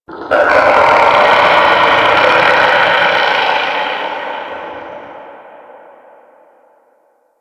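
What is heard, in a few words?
Distant Eerie One Off, Shipyards; Warframe; Grineer Shipyards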